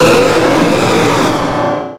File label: pokemon cry